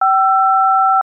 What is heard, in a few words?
dtmf dial tones